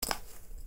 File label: Golf MMC insert; Nokia Ringtones; Audio themes